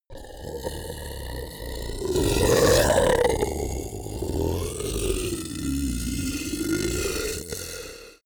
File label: beast grunt warning angry; kreaturen; comedy-cartoon; soundpool